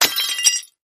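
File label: Craft Vase Type 4 Crash, housewares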